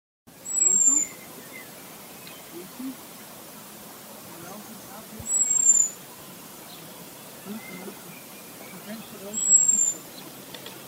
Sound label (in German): Luftalarm